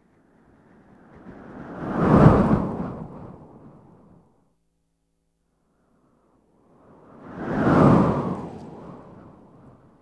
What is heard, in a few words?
Strong Wind